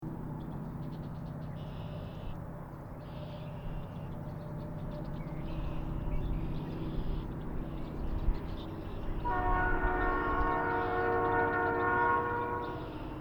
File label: horn; p3; CEFX 3138 sd45